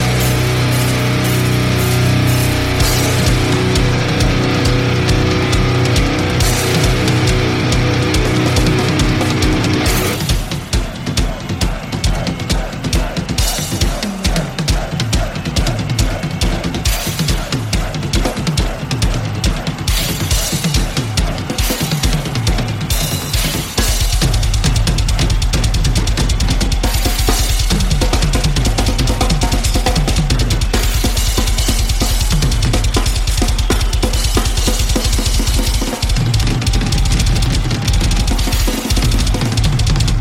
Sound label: Drum Solo